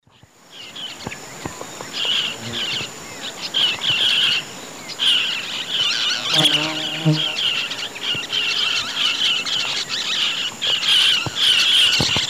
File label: Touit huetii, flock flight calls; S. America; Eastern Lowlands